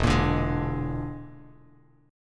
E 7th Flat 5th, down, Bass Standard

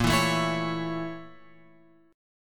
A sharp Minor Major 7th Flat 5th A sharp; A#mM7b5 Chord {6 7 7 9 X 9} strummed

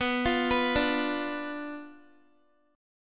B Diminished B down Guitar; arpeggiated; tuning 4F,4A,5C,5G,5C,6E

